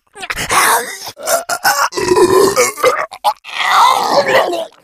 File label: amb; cstrike